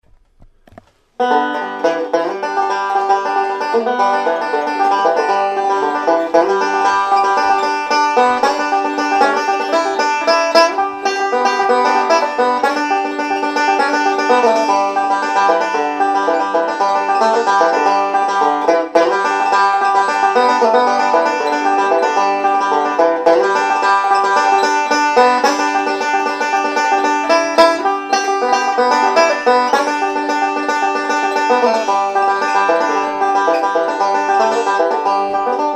banjo

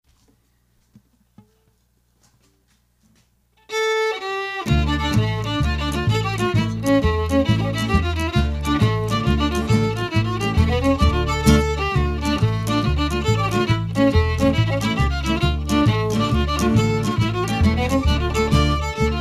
irish, fiddle

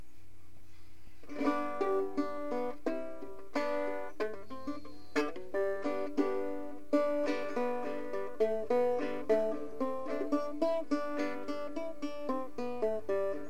banjo

